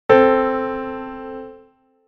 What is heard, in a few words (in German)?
Intervalle simultan